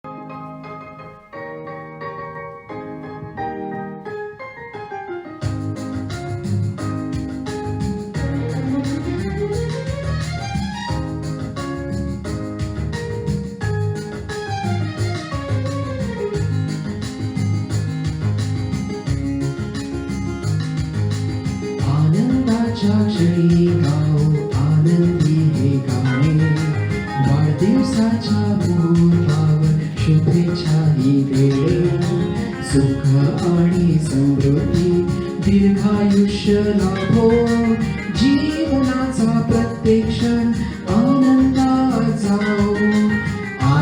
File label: Happy Birthday Song in Marathi